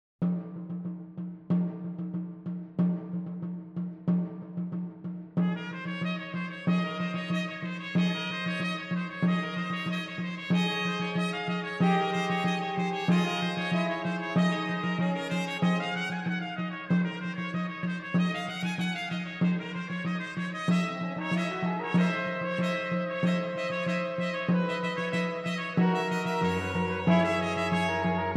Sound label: Armenian Scenes, Wedding Procession, Brass Quintet; Atlantic Brass Quintet; A Musical Voyage